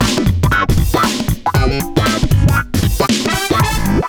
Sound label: ringtone, electro, funk; ringtones; Funk Y'all; Google; NewWave Labs